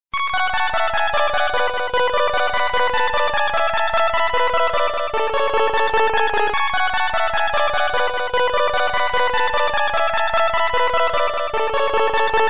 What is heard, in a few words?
apple hd tone ringtone